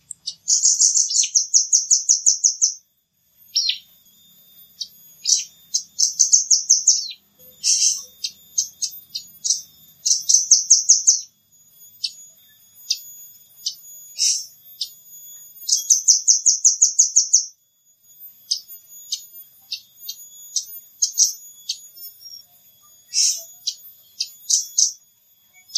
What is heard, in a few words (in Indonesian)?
Suara Burung Rambatan